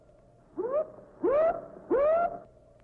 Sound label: Navy Growler Alarm